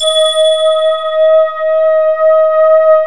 D50-ISH

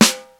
SNARE; E-MU Formula 4000 Series Vol. 1; Hip Hop Nation; Bonus Perk

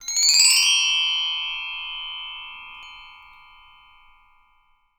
BRS MRK, TREE BELLS; Voice Crystal - Steve Reid Definitive Percussion

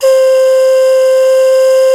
BRAZIL WH, World Whistles

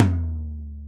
Tom 14, Drum Machines

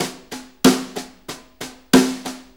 Brushes Loop, Full Kit Loops; Sonic Foundry (Sony Creative Software); Loops & Samples Drum Tools

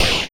Wah Fx; Sonic Foundry (Sony Creative Software); Esoterik Beatz

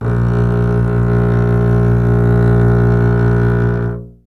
STR CBS, Solo Instruments

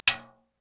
TIMB RIM, Korg Electribe EMX-1

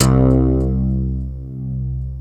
MIDI GTR C2; East West; Global Sounds

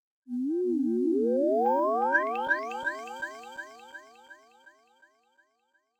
Syn Fx Loop 125; Equipped Music

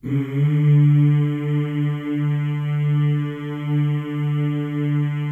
male; Spectrasonics; Symphony of Voices

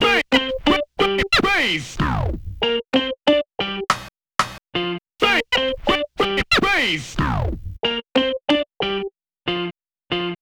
Melody, Hip-Hop

